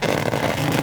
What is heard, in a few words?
Stretch Twist, Foley Creaks; SFX Kit